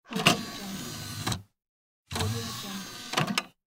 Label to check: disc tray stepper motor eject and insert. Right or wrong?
Right